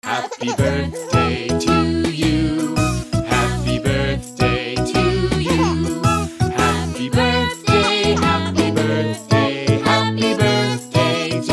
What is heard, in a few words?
happy birthday song for kids